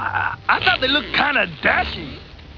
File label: pain